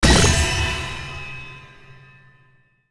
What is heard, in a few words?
ui academy prestige levelup